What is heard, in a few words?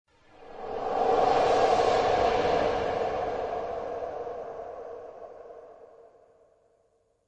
Breather; Brand X Music; Brand X Music Catalogue - Editor's Essentials